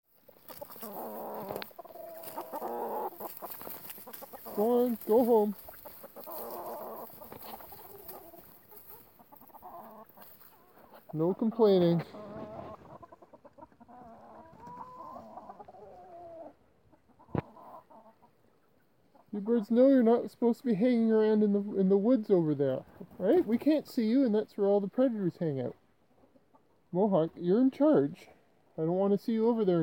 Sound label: boys grumbling